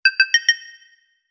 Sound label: Message metal